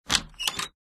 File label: door lock; cs2d; cityrp; MCity